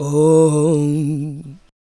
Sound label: ADLIBS